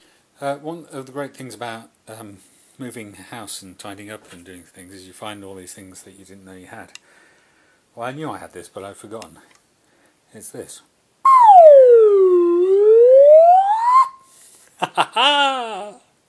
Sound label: whistle